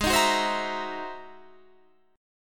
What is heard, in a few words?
Ab 7th Flat 13th, chord, guitar; strummed